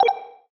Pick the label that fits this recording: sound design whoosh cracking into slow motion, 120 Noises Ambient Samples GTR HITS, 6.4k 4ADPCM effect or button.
button